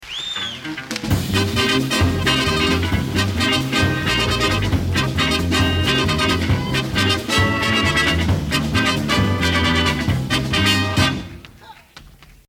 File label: Instrumental Bridge